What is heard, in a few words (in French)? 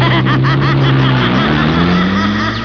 rire